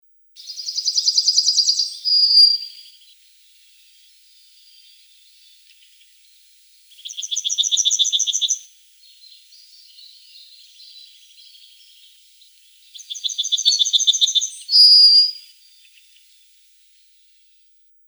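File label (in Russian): Ovsjanka beloshapochnaja, pine bunting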